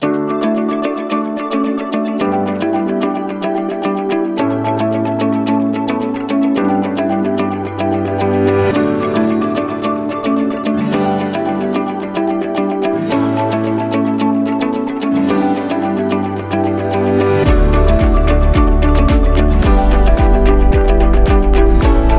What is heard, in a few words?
Corporate Ambient; corporate music vol 14